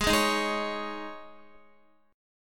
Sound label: Ab Suspended 4th, Guitar; Absus4 strummed; x,11,8,8,8,9; JGuitar